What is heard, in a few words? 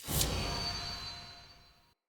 UI Rank Up Impact Message; Hud UI